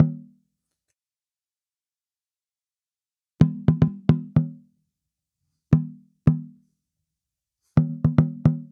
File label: Tambora, African; Sonic Foundry (Sony Creative Software); Continental Drift Aftershocks